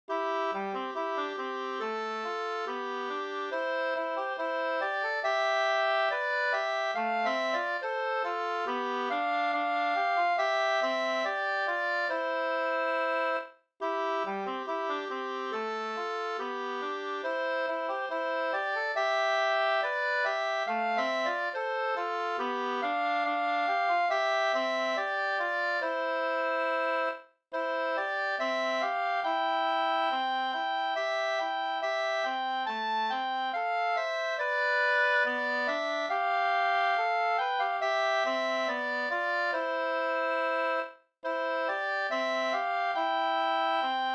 brudmarsch; fr Tolg; e J Johansson

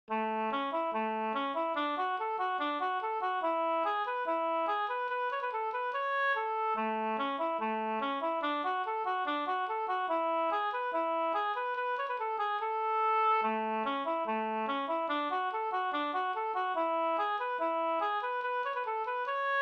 stjuls, Adur

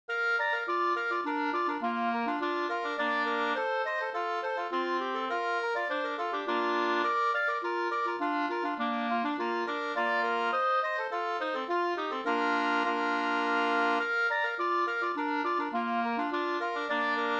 polska rullpolska

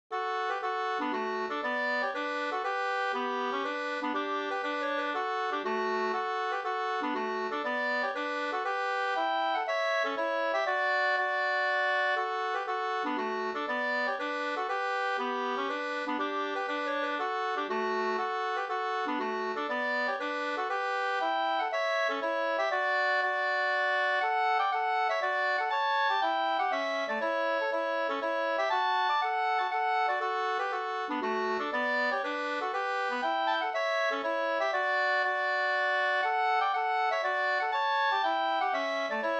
hamburska; e August Engstrom e Johan Albert Ahlgren; Taxinge, Södermanlandd, Olof Anderssons uppteckningar 1936 i Södermanland, nr 320; med andrastämma

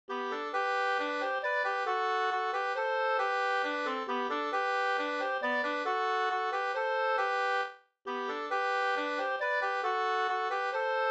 langdans; Nigdansen Ahlstrom 2st

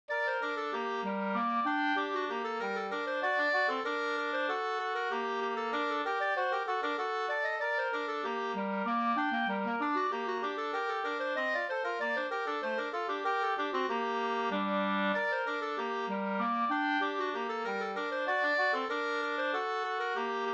slängpolska polonese

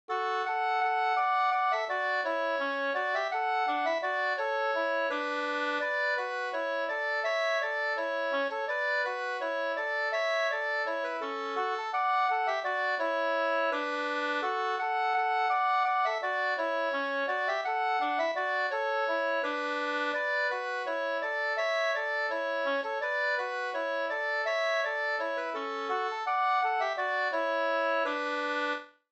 vismelodi; Skata satt pa taket; Vingaker

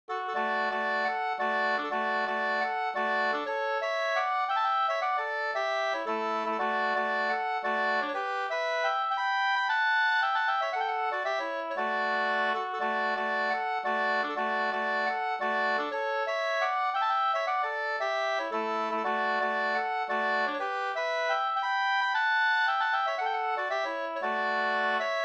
polska Bodapolska

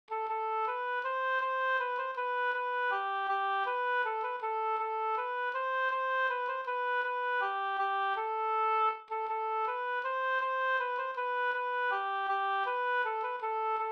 vals Amoll

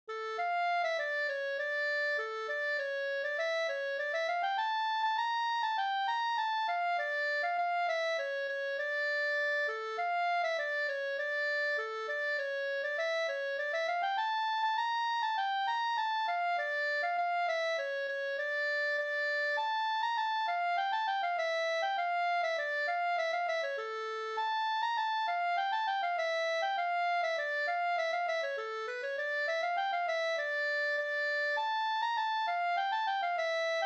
ganglat Fabodlat; Malarhojden

